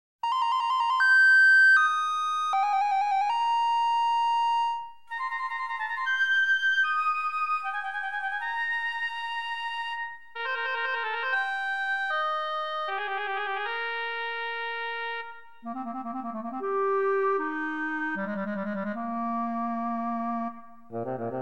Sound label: The Flute of Pallas; hercules glory 2 titans downfall